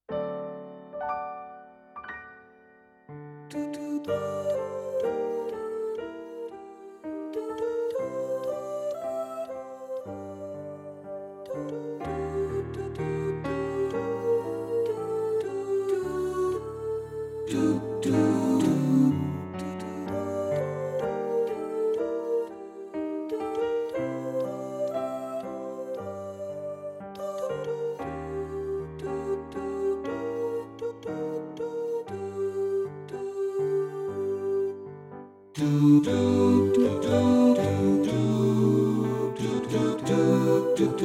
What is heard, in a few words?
Tenor; Far jag lamna dessa plommon